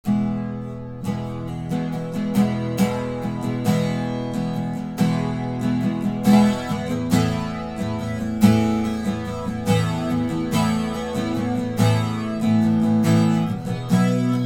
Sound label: flange; audio examples from the book